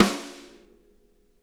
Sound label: snare rim, drum kits; Sonic Reality; Interactive Drum Kits